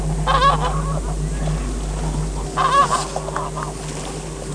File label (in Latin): anser fabalis